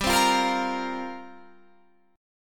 F Suspended 4th G; Fsus4/G; strummed

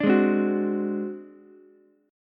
G sharp 7th Flat 5th G sharp down Ukulele, chord; G#7b5; strummed; JGuitar

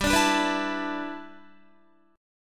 G 6th Add 9th G Guitar, chord; G6add9 strummed

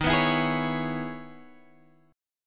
Db Minor 7th Eb

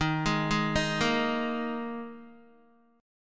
D sharp Suspended 4th, Guitar; D#sus4 arpeggiated; JGuitar

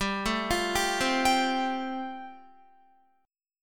G 7th Suspended 2nd Suspended 4th, Guitar

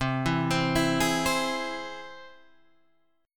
C Augmented down Guitar Open G

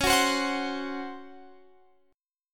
Db Major 7th Suspended 4th, Guitar Open F; DbM7sus4; strummed

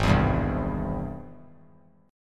G sharp Augmented Major 7th; strummed; 8,11,10,10; 3C,3F,3A,3D; JGuitar

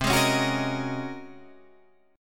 G sharp 11th C

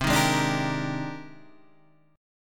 G sharp Major Sharp 11th C, chord

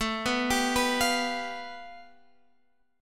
A 7th Suspended 2nd Sharp 5th, Guitar, Open G; 19,16,17,16,18,x

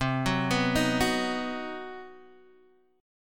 C Minor Major 7th Flat 5th chord, Guitar DADGAD

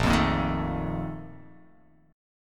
A sharp Major 7th Suspended 4th

